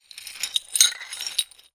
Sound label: glass pieces broken small thick movement ground; zapsplat